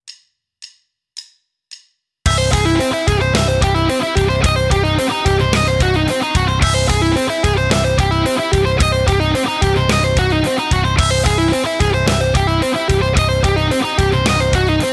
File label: Rockschool, Guitar; Guitar Techniques 2019 Issue 297 July